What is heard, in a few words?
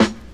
smooth snare drum a key; Mono; snare-drum-samples